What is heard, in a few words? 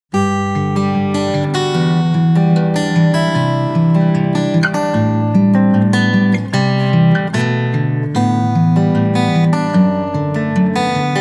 pick